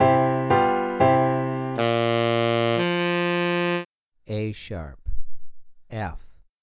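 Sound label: Tenor Sax 2 Note Melodic Major a#1 f2; ear training; melodic ear training for musicians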